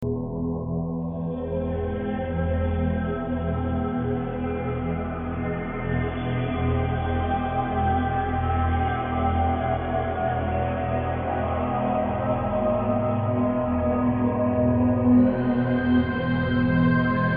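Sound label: Vault Ambient Loop; F.E.A.R.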